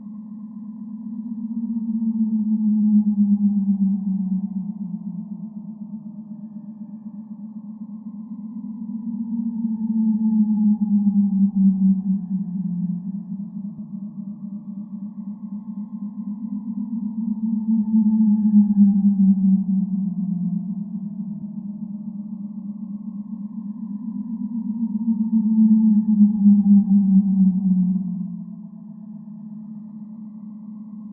Wobbling Waves - Endless Falling Loop, Shepard Ambiences; The Sound Pack Tree